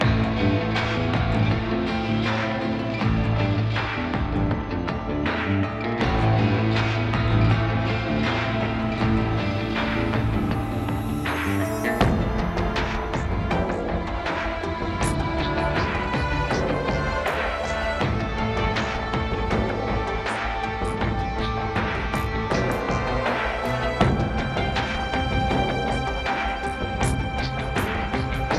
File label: dark alley loop